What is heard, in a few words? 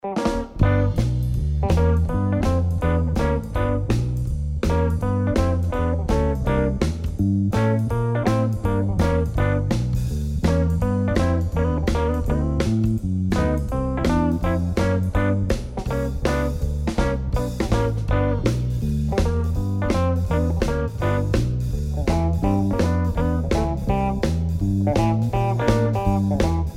Swing Shuffle Blues Drumming